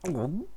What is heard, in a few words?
gulp; pillPopper